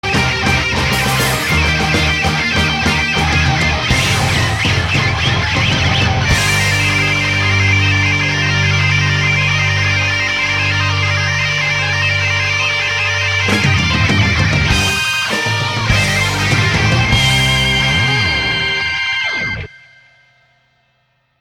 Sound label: Speed Metal Sound